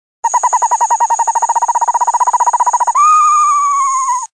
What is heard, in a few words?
Fall Screech Owl